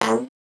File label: VocoBit Noise, 8-bit; musicradar; 8-bit bonanza